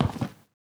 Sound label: concrete, footsteps; Sourceforts2.0